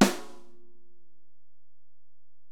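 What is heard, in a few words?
Acoustic Snare, Alesis DM-PRO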